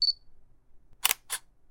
camera shoot

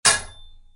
sword attack; jidaigeki

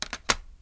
putting a CD case

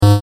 blip

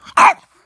maltese dog; animals

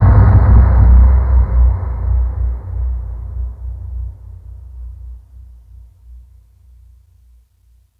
shock; horror

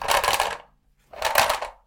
toilet paper; トイレットペーパー２

